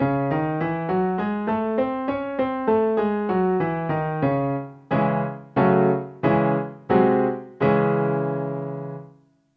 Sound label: C sharp harmonic minor scale and primary chords